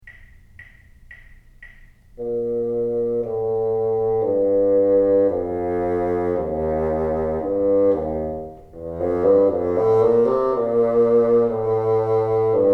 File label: teacher, bassoon